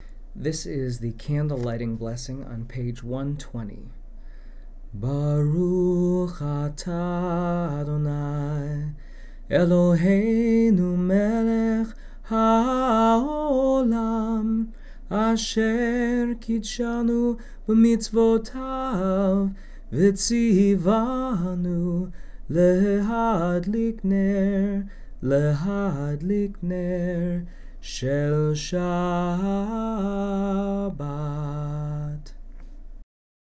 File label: Candle lighting